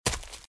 Footsteps - Leaves - Run - Right; RadwinIsland

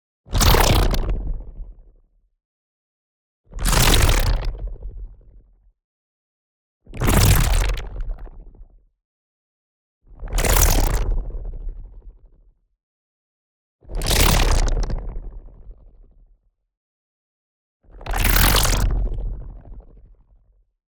NEON WHOOSH LARGE FAST Stuttered Particles; boom library

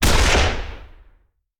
Rifle; Sound Ideas; Guns

